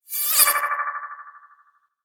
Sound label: push and go; message tones